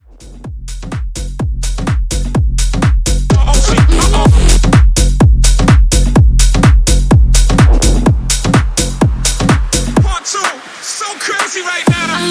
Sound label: tech house intro